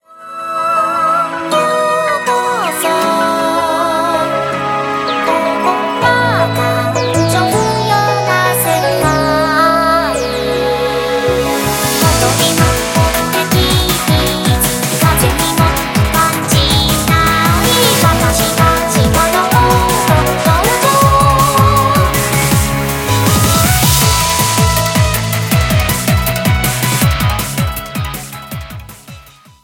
BGM xfade; Invaxion

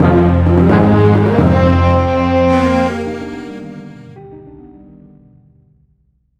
Jingle Game Over

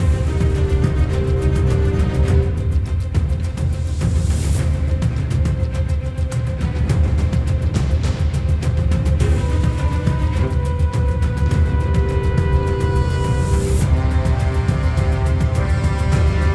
Combat Normal; BIOMUTANT

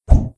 Rope; Hero in the Ocean 2

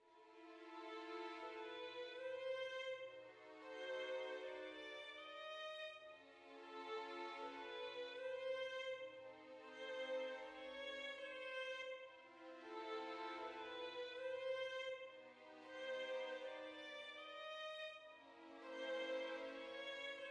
dark soft

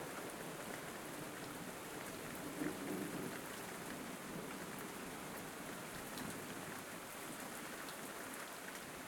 Nightmare-Rain-Loop

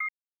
Click

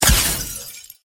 Shield Break Sound Effect

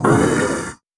Mutant Pain; Cruelty Squad

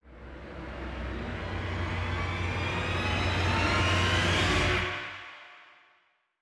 Transition Low High Action Riser; gamerip

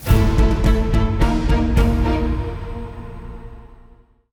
Victory Defeat; Endless Legend

